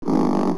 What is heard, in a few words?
roar; dungeonmaster